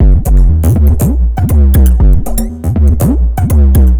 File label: Drama Drums (120BPM); musicradar